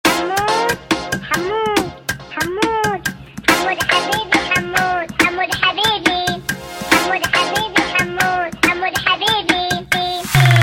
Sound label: Funk sped Up